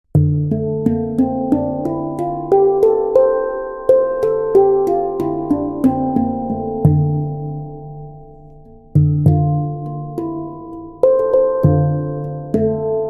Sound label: handpan 10 notes d minor